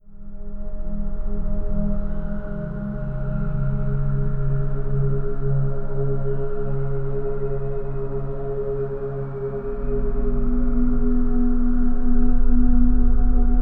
White Noise Zen Meditation Sound Classical New Age Piano Music White Noise For Baby